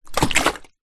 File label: household kettle water movements